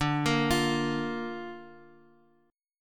D Minor Sharp 5th D down Guitar Open F; Dm#5 arpeggiated; JGuitar

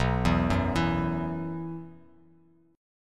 B Suspended 4th, Bass Standard; Bsus4 arpeggiated; JGuitar

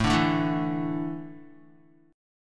A Suspended 4th, Guitar; strummed; JGuitar

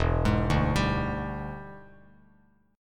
E 7th Sharp 9th E down Bass Standard; E7#9 arpeggiated; JGuitar

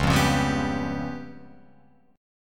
C sharp 7th Flat 5th C sharp down Guitar Open F; C#7b5; strummed; JGuitar

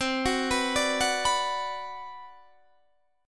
B Minor C; Bm/C arpeggiated; JGuitar

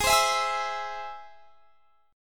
Ab Augmented Ab; x,23,22,21,21,24; Ab+ strummed

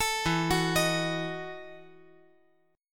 E Major 7th Suspended 2nd Suspended 4th

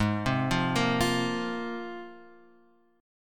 Ab 6th Add 9th Ab, Guitar, Open F; arpeggiated; JGuitar

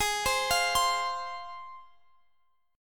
G sharp 6th, Guitar, Standard; arpeggiated; capo 9; chordshape; x,x,18,17,18,20

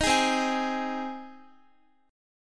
C Minor Sharp 5th C down Guitar; Cm#5 strummed

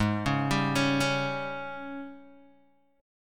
G sharp Major 7th Suspended 4th, Guitar, DADGAD; 6,4,5,6,4,x

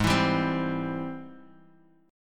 Ab Augmented Major 7th Ab; strummed; 16,19,17,17; JGuitar

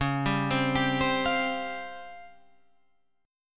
C sharp Major 7th Flat 5th, chord

